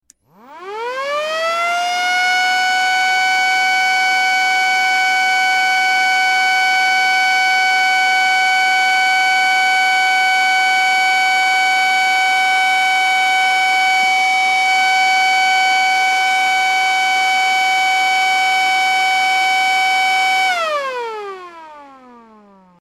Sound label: Motor Siren S Series; Iransiren